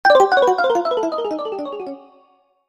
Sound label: player losing or failing; mixkit